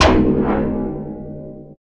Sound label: SPACE JUNK, Impacts, Hits & Booms; Distored Reality